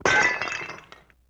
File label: Glass Bottle Break, Vintage Cartoon, Foley; Sony Pictures Sound Effects Series Volumes 1-10; Sony Creative Software